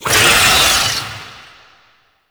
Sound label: Wall Climb Impact Vocal; Warframe; Oraxia